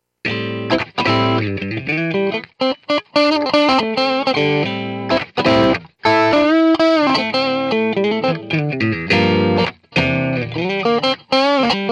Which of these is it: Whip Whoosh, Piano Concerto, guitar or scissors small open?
guitar